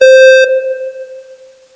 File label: tone2; MAXOVER